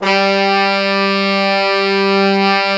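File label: low brass, fat pop section; Roland L-CDX-03 Disk 2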